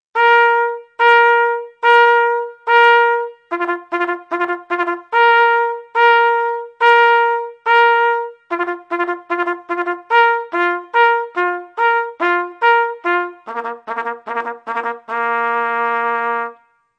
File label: The General, bugle